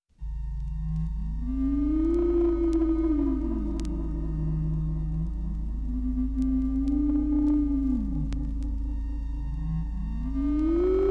Science-Fiction Mikronen Intergalaktische Feinde

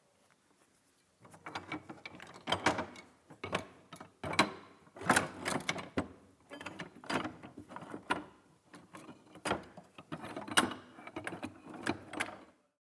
Rudel Haemmer

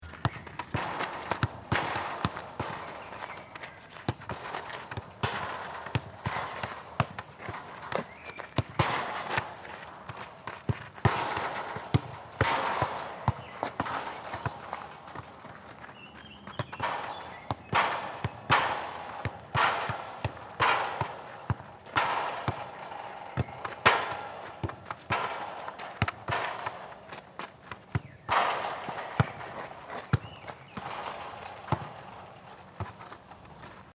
Spielplatz Fussball

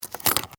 office Ordnerverschluss

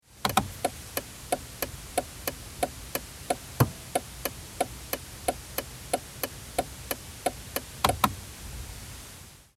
Auto Blinker

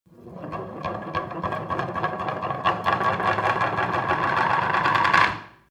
Brettchen rotiert

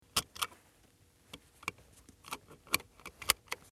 Auto anschnallen

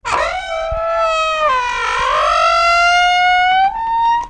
quietschen

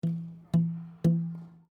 Zupfen an Bespannung

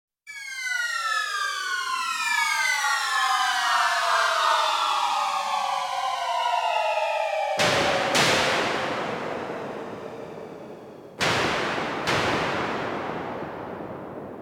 Luftwaffe Bombenabwurf

